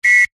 foley whistle two tone single blow close up

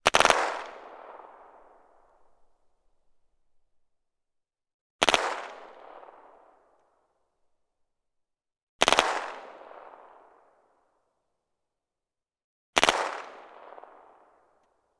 gun ppsh; still north media

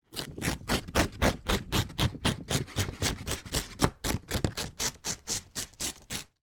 paper rip several times short and quick; foley

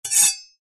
knifes medium scrape blades together

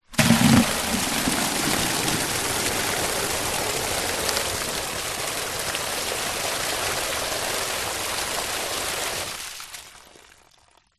nature water pour plastic bucket heavy flow; zapsplat

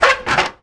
industrial metal ladder scrape single short; zapsplat

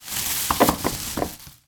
food apples tip from plastic bag into bowl

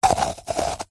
coconut shell 2x halfs empty scrape turn hollow; zapsplat

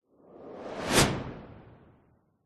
sound design whoosh fast rustling; zapsplat

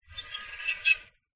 foley metal sharp scrape squeak shrill

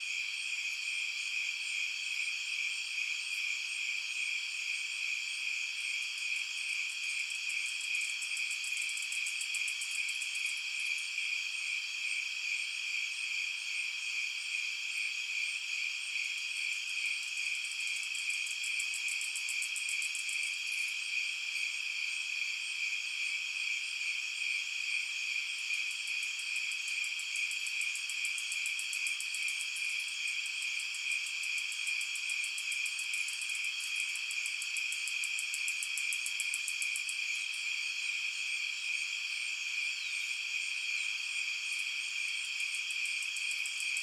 Night Insects; Blastwave FX

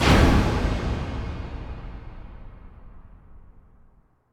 Impact Boom Orchestral no piano shovel; bottlerocket

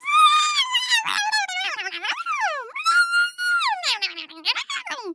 FUN MONSTER Kitty crazy celebrate

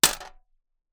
Coin Drop in Tin Coins

